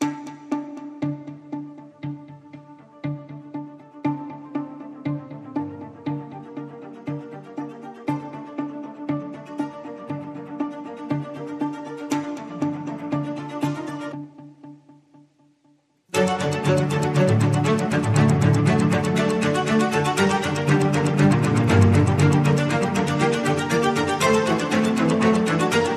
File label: Devious Waltz Chamber